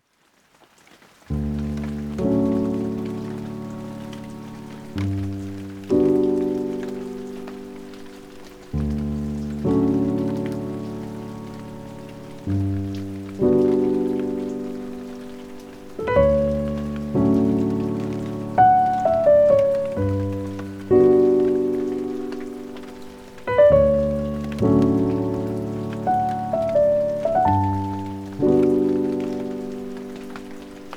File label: moonlight piano with rain